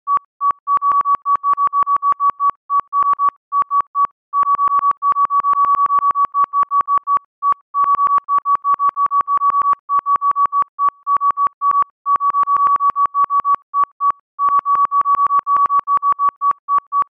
science fiction gps receiver signal; sound effects one

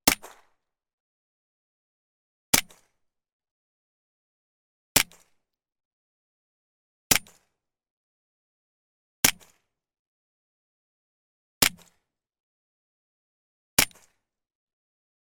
gun marvel suppressed .22 handgun; still north media

